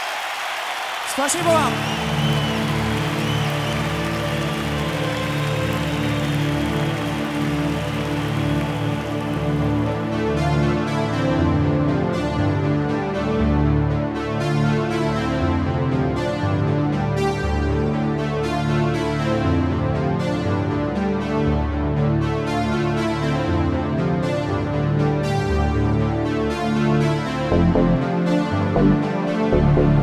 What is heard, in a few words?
Instrumental